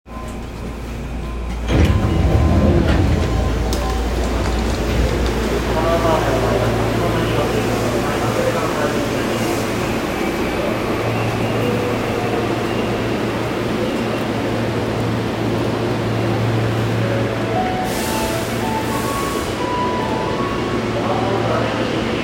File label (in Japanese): 西武新宿線高田馬場東, train sounds